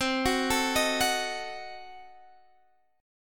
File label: C Diminished 7th, chord; arpeggiated; Cdim7; x,15,16,14,16,14